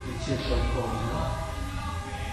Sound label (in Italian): dopo voce soggetto sensibile si sente un suono di un corno e una voce